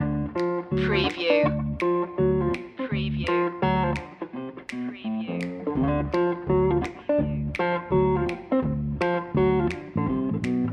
fixin to score loop

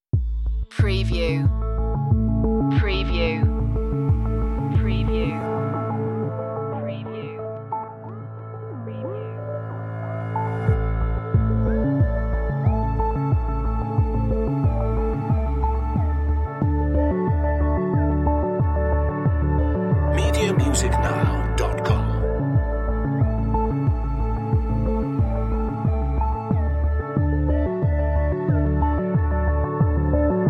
corporate sooth

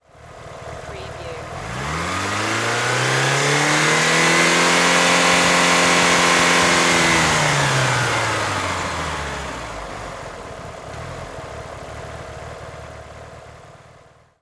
MITSUBISHI EVOLOUTION ENGINE LONG REV

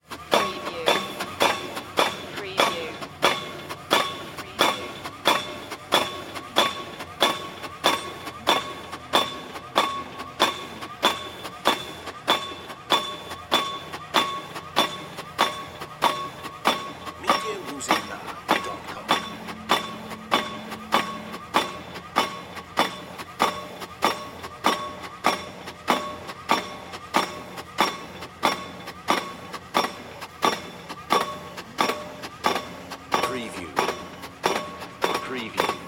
Pile Driver Operate; sound-effects-bible

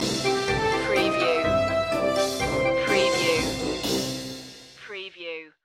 a quirky flourish stinger

